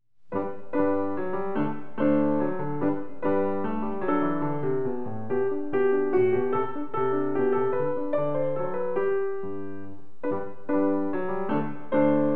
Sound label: German Dance